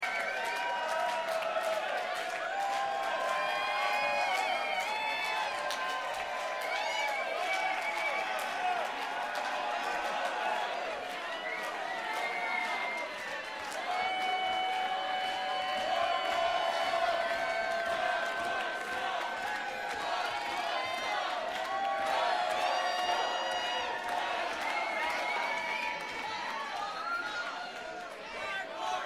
Encore Clapping

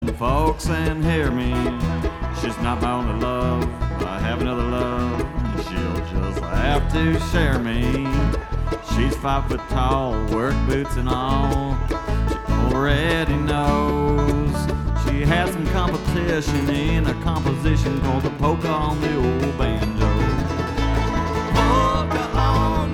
Polka On The Banjo

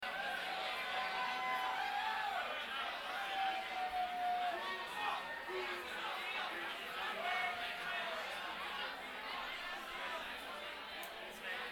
Applause

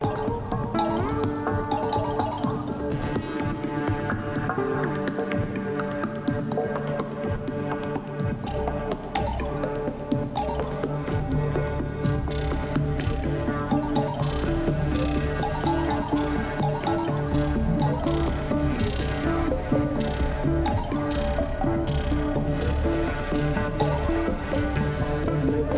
Drums

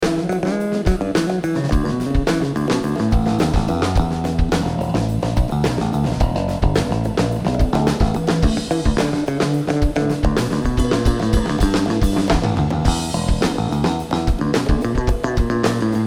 Drums and Bass

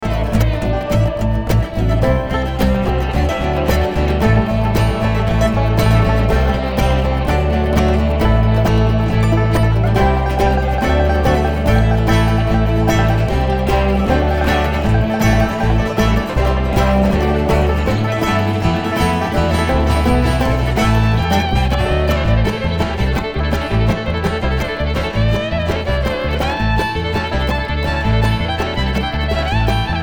Traffic Jam

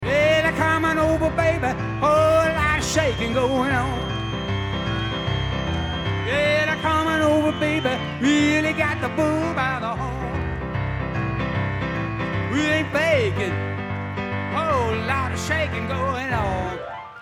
Piano Moment

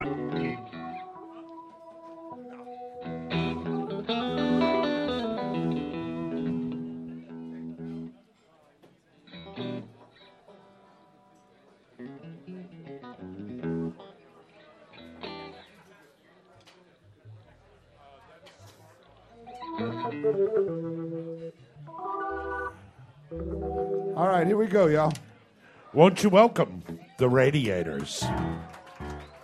tuning

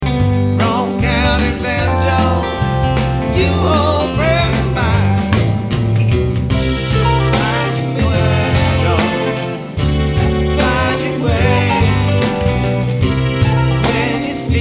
Banjo

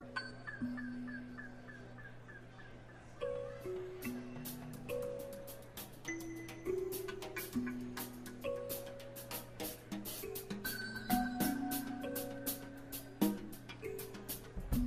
Talking Drum Kalimba Jam

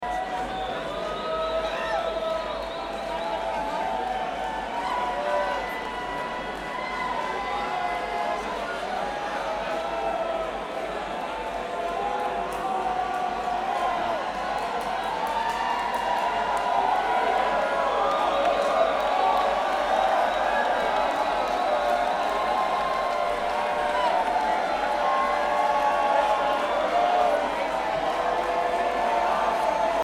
Crowd

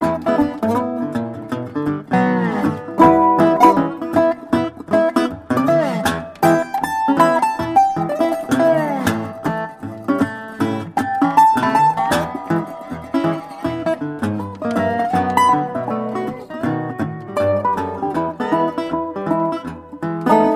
Blues

